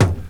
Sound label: TOM TOM; E-Lab; X Static Goldmine 2